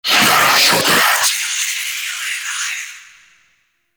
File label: Journey Screams; Warframe; Dante